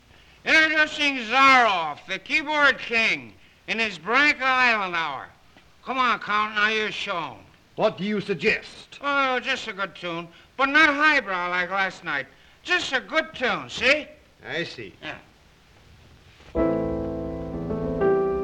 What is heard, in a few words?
Piano